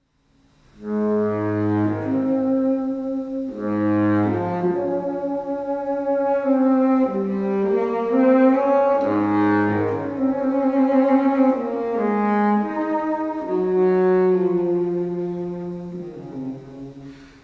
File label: Violoncello Suite I Sarabande; J.S. Bach